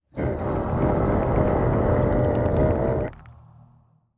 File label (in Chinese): 监守者愤怒声